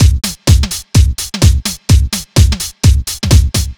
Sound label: Funk No Loop, Drum Loops 127bpm, Nu Dance Electro; Zero-G; Soundclash Nu Dance Electro; Acidized Wavs; Jumble Funk